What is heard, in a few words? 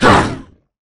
banshee pain; garrysmod